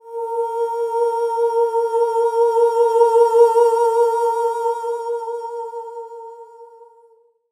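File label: SOP X2 A#4 R, soprano; Spectrasonics; Symphony of Voices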